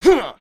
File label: Male Grunt; dialog